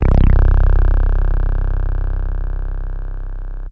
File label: squirt bass; Basses